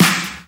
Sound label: Snare; Vengeance Samples